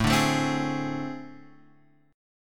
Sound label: A Minor Major 7th Double Flat 5th, down